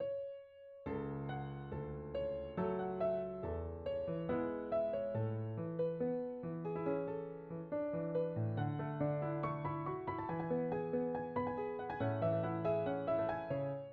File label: crescendo